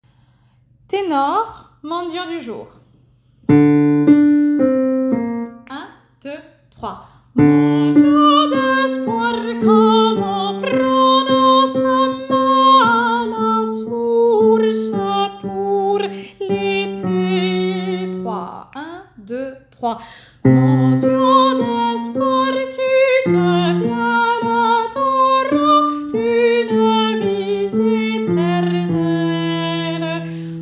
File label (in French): Mendiant du jour ténor